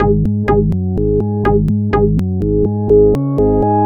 arps up Ab 124, synth loop; Synth Loops; Zero-G; Soundclash Nu Dance Electro; Acidized; 124bpm

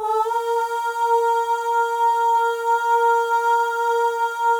OH, FEM, Symphony of Voices

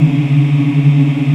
VOX AFMM, VOX Afro Mms, Africa; Roland LCDP11 Africa VOL-1

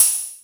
Beat Box; E-MU Pro-Cussion

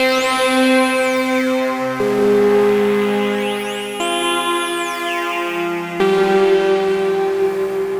Messah Pad; Strings & Pads; Sonic Foundry (Sony Creative Software); The Electronic Music Manuscript Collection

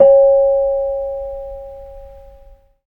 KENONG Db5, GAMELAN SET; Spectrasonics; Heart of Asia

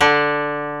KOTO D2, Earth Tones

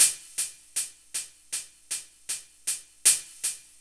GRV, Guit It On

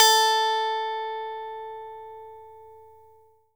E-GITARRE, Alpine Volksmusik; Best Service

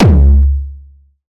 Jump kick, Jump hardstyle kick

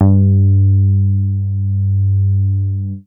MOOG BASS G2; Best Service Dream Experience

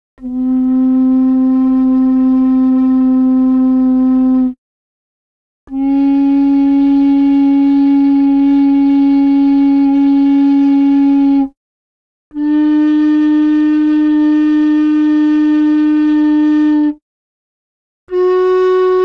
Gamelan; Propeller Island - Complete Gamelan

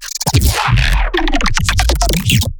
glitch stab; Virtual Riot; Glitch Stabs

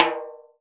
snare, timbales; Univers Sons; Basicussions

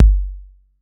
BD 808

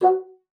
bassoon, F#4; Xsample VOL-6

